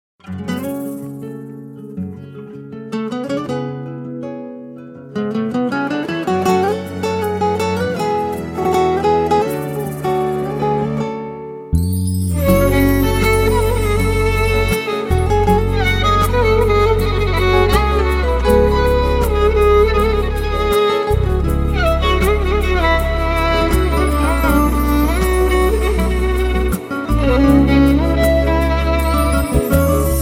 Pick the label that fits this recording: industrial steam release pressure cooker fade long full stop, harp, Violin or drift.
Violin